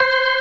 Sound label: STOPS, ORGAN; InVision Interactive; Keith Emerson; ORGAN SPLIT